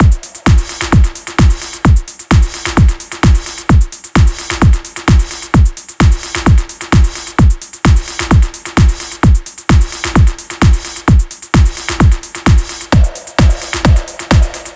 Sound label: Beatnik